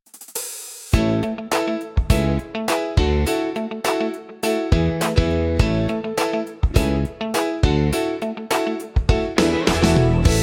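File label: in anticipation of ovations, dlya ofisa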